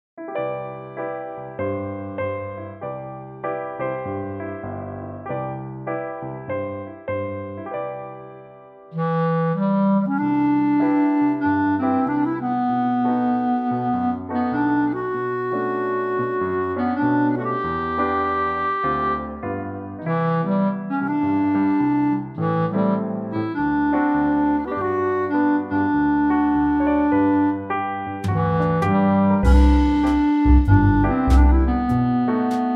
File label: C play clarinet reference